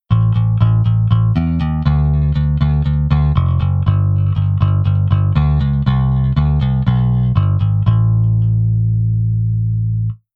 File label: Bass Velo Up Dn Alt